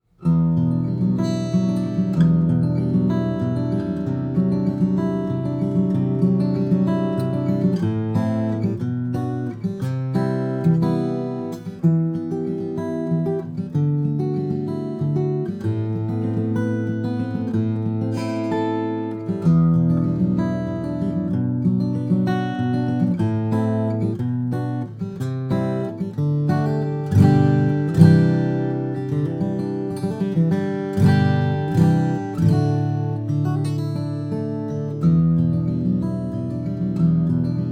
mccollum grand auditorium, steel-string flattop guitar